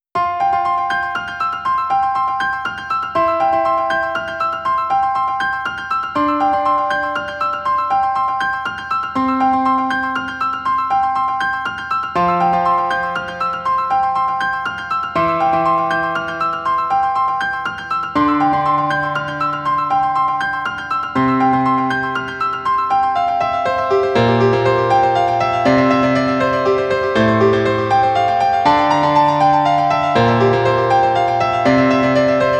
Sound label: pf delay